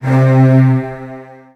C2, Virtuoso Strings, 16TH; ILIO; Kirk Hunter; CL 16TH

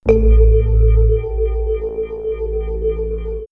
Swirls, pads; Spirit; X-Static Goldmine

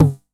909 TOMS; Wizoo - Platinum 24 Electronic; 909 TOMS P2

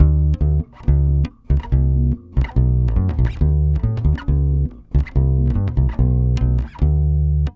BASS GROOVE, Brazil Bossa; AKAI; USB Soundscan vol.16

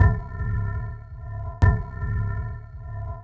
Ambient Rhythm Break; Cinematic Funk Urban Tone Poems; Sonic Foundry (Sony Creative Software)

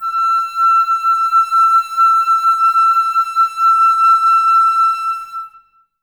Flute, Woodwind

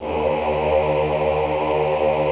AHH D1, choir; Best Service; Extended Classical Choir; AHH MIX

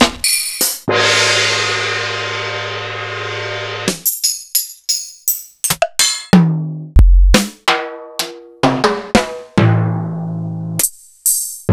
EMU Proteus-2000

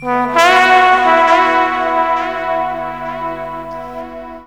TROMBON FX; USB Soundscan vol.27

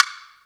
clave; PERCS KIT; Ragga & Dub Reggae; USB Soundscan vol.27; AKAI

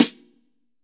SN, Snare; AKAI S6000 CD-ROM - Volume 4

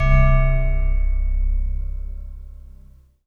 World of Synthesizers, SYNBELL

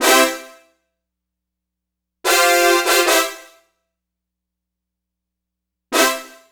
Brass Section; Dr. Fink's Funk Factory

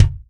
Real Drum Kit, ROCK KIT

